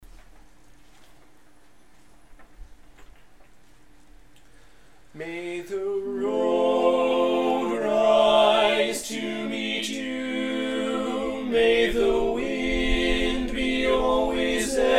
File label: irish blessing (baritone dominant)